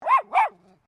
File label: Animal Dog Poodle Miniature Bark; Various domestic dogs